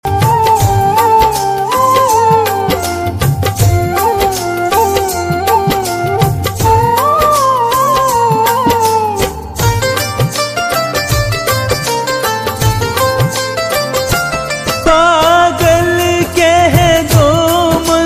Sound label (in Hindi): Khatu Shyam Bhajan